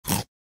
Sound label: Crunch